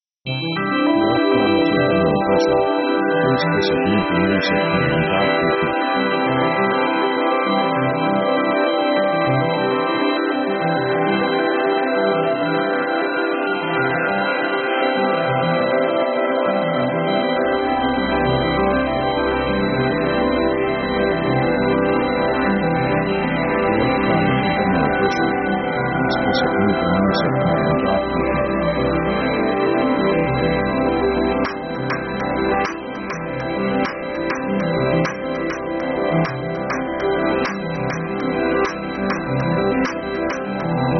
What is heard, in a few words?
synth ethereal; synth themes